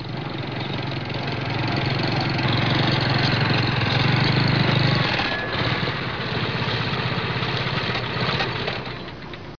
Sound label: trucks